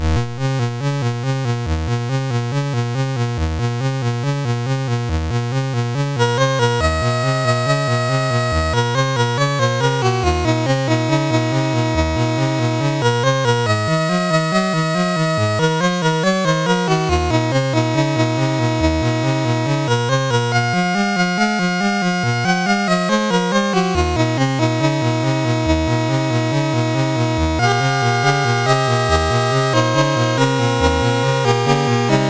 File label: Gatlinburg Boogy, sid MOS6581R2; Master Composer